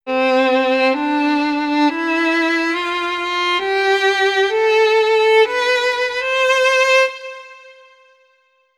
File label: Violin The C Major Scale